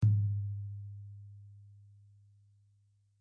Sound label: Tom, DrumKit, REAL LIVE KITS; Osama Qureshi DrumKit